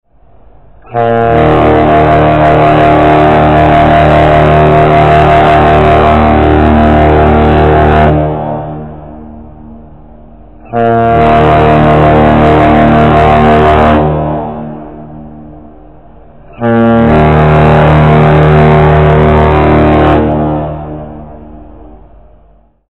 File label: horn ringtone